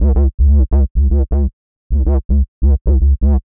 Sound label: 126 C# BASS, Funky Underground bass loops; Loopmasters; Funky Underground Vol 2